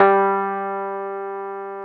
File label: Keyboards Old School, G2; USB Soundscan vol.09; AKAI